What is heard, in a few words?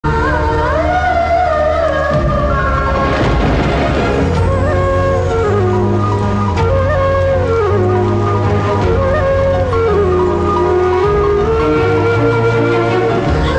love emotional bgm